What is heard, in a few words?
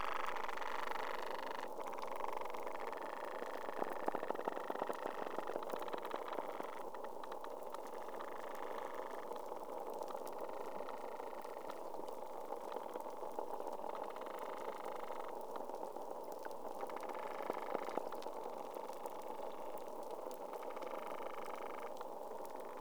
Fish Combo